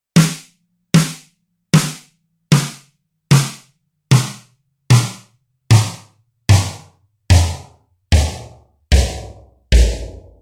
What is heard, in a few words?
Parameter Tune